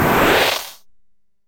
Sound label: secret wall, puzzle mechanics